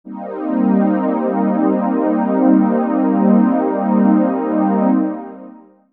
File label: Digi Pads; Jungle Frenzy 1